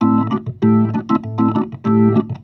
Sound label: B3 LOOP A, PLAYED